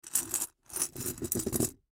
Piggy Bank Fall Over Roll; Money Sound Effects; Objects; Foley

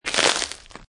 Snow And Ice Ice Stretching; also good as bone break, breaking bone sound

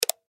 Button Switch

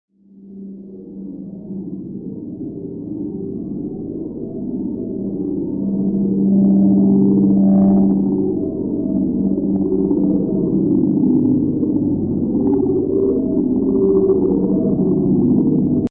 Tran, thriller-horror ambience sound; High quality thriller-horror ambience sound perfect for building tension and drama in film or game scene.; Scary Soundscape

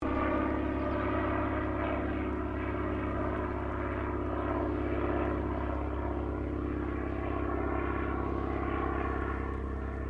AIRCRAFT CESSNA O-1 BIRDDOG PASS; Cessna Birddog spotter aircraft; Airplanes (Sound Effects:Vehicles)

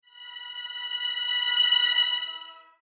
Cinematic Whoosh Flyby

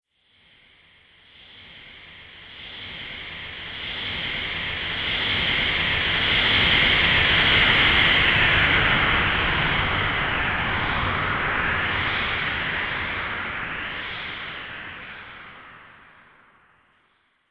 Astral Winds, Science Fiction Sound Effects

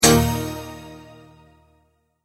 Multimedia Tone-Stop; Sound Design; McKinney Sound

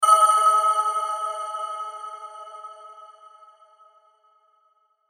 Hit Chopper, Hit Chopper Shimmer; Hit Chopper Bells Shimmer

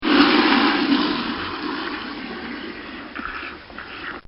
Fill Bucket With Water; Nature

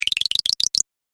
Magic Tokens Game Fx; Video Game Sound Effects

